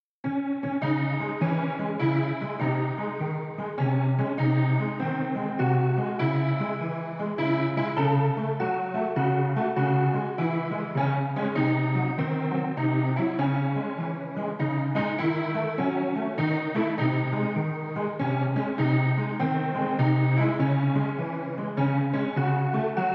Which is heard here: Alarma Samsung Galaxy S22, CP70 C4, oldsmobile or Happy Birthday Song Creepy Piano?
Happy Birthday Song Creepy Piano